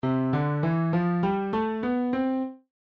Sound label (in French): mode de do